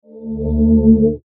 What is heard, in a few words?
Logo Short Mellow Swell; Sonic Logo; Blastwave